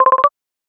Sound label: ringer, chirp